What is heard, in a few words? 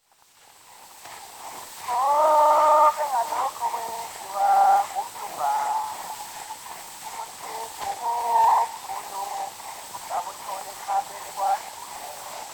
Zulu Recordings; Father Franz Mayr; Isililo